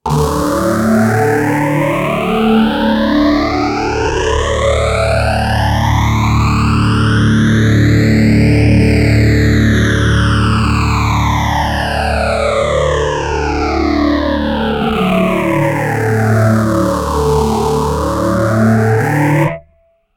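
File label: Rat guitar, Moogerphaser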